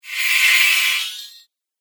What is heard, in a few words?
Reverse Cymbal Whoosh; Whoosh Sound Effects